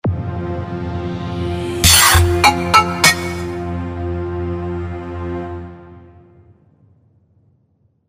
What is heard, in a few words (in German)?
Soundlogo Fliesenhandel